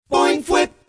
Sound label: boing